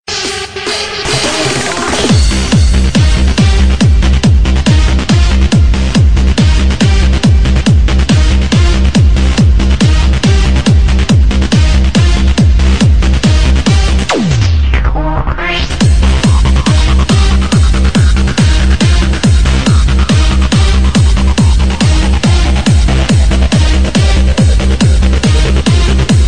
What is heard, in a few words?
Hardstyle